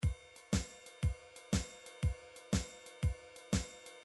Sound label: midi drums gumbo